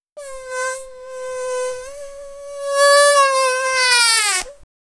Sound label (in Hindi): ek baccha baithkar balloo, balloon inflation